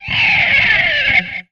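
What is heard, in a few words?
Music Guitar Pick Scrape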